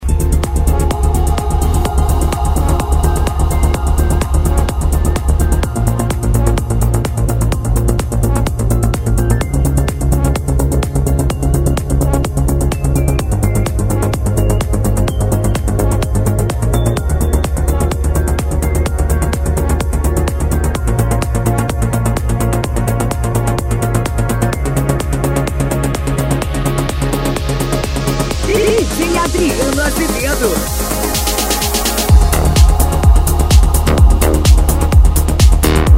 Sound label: progressive eletronic music